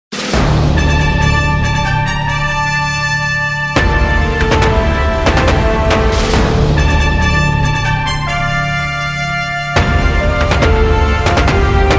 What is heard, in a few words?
Kabaddi Anthem BGM Theme Ringtone